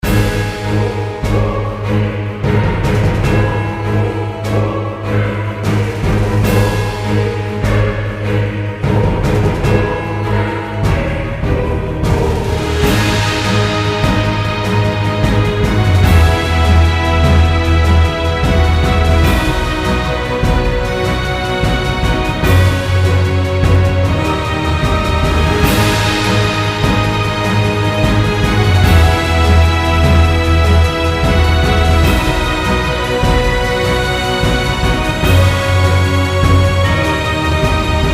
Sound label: loop beta; castle crashers